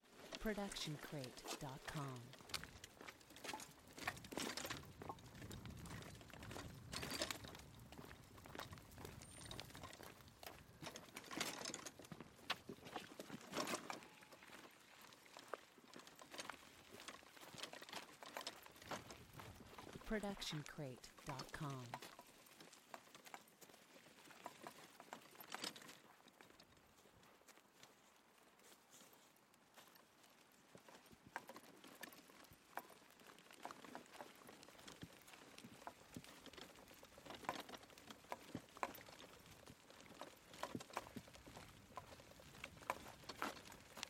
golf sounds walking with wheel push cart; stereo; soundscrate

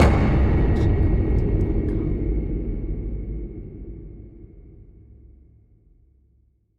cinematic hit dark worlds; soundscrate

